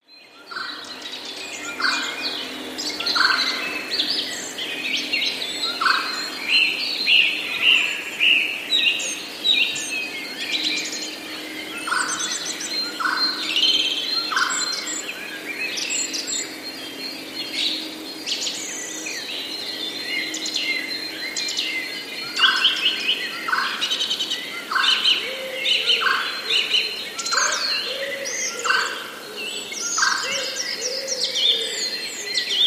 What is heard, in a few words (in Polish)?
sojka; jeszcze jeden rodzaj wysokiego, dźwięcznego krakania